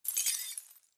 imp bullet lightballistic solidglass debris

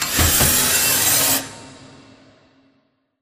Giant Pillar Pods Move; Titanfall 2; Boomtown

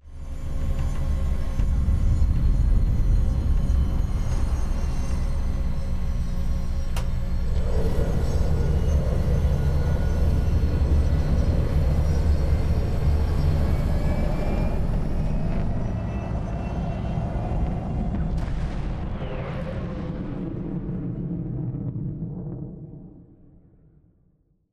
dropship fly in amb; Titanfall 2

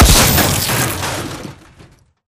bullet generic exploding head kill shot android; Titanfall 2

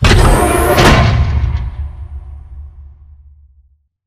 wall run panel move; beacon; Titanfall 2

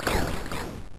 bullet generic titan shield scatter; Titanfall 2

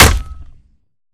Imp Bullet Light Ballistic Solid Metal

